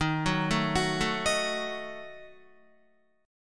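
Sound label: E flat Diminished, Guitar; Ebdim arpeggiated; 10,9,9,11,9,11; 4F,4A,5C,5G,5C,6E; JGuitar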